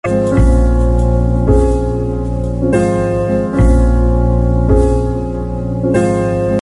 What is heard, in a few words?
melody